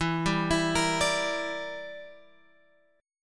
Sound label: E Minor 7th Flat 5th E, Guitar Open G